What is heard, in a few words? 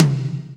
TOM TOM, Roland